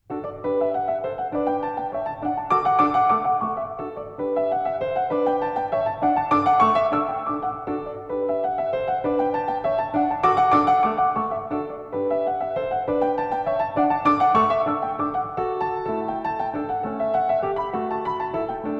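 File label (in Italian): pianoforte, Valzer in re maggiore per pianoforte; Ludwig van Beethoven WoO 85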